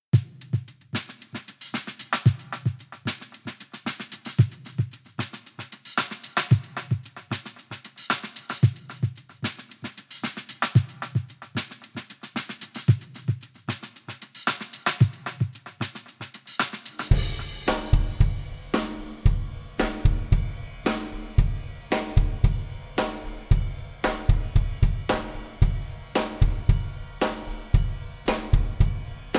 Drums Renaissance Reverb Preset Hall 21 prozent wet

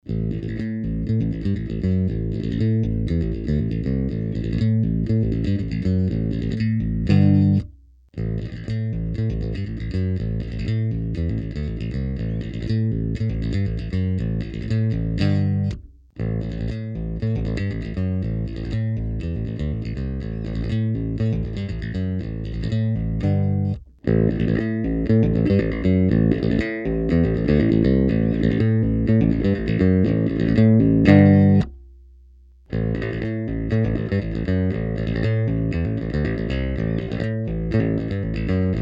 Mid Left Kipp l m r Mid Right Kipp l m r; Taurus

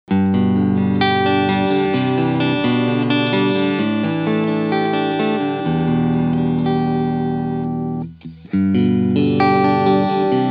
Traveler Guitar Travelcaster Deluxe Cleansound alle Pickups

